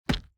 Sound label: object drop bait